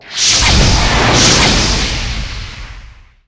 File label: Game missiles